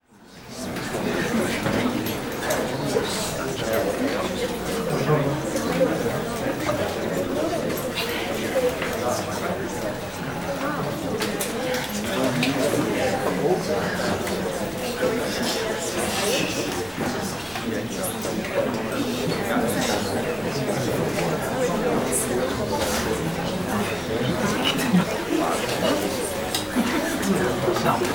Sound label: Crowd Indoor Hushed Crowd Formal Affair; Walla; Sound Ideas; Walla Series